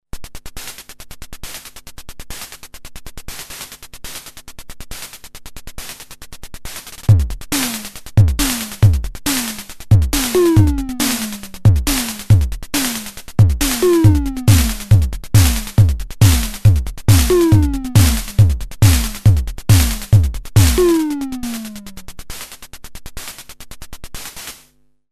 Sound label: Drumfire DF-500 Test Loop